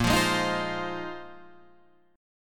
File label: Bb 7th Suspended 2nd Suspended 4th Bb down Guitar Half Step Down; Bb7sus2sus4 strummed